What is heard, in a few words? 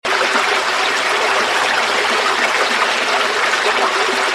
river under bridge